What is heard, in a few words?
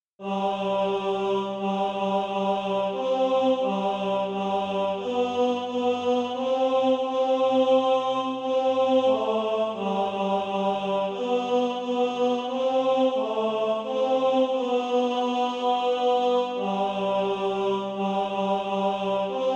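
tenor, Practise Music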